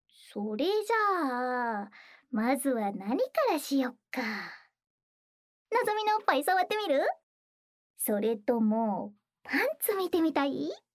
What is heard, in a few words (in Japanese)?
メスガキＪＳの手始め耳舐め（左耳）, ASMR